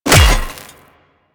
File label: Melee Hammer Impact Robotic Flesh